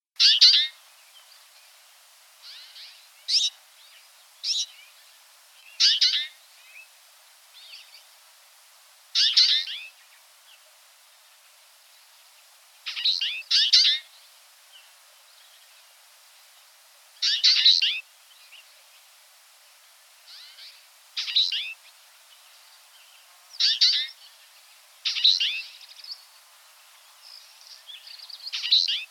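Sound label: twite voice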